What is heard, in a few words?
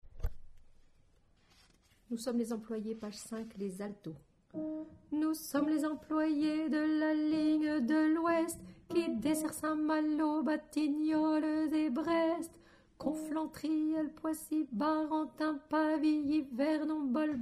Alto